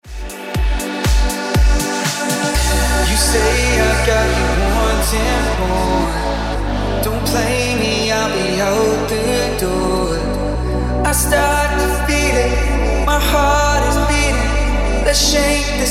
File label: Ringtone